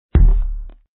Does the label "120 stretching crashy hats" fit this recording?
No